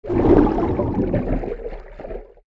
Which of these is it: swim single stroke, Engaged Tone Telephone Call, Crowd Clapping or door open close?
swim single stroke